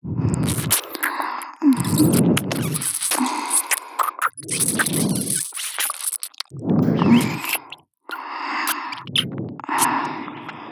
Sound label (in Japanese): メイド様の両耳舐め (ループ); SEあり